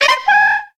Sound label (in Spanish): Grito de Chatot